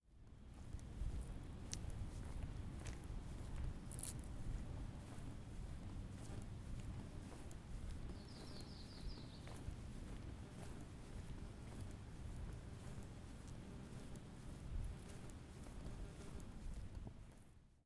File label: walking; uvic